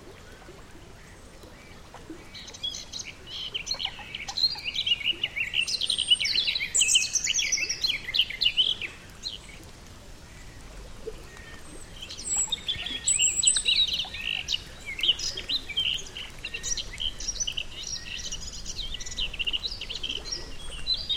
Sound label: small lake birds habitat